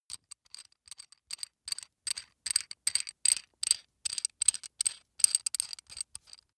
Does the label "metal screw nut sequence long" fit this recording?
Yes